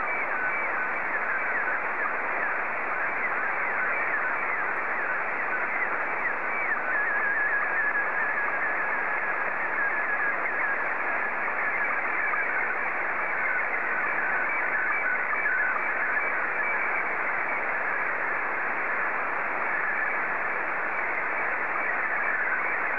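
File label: slow-scan television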